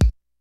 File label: KICK SNARE; Kid Nepro; Elite; KICKS SNARES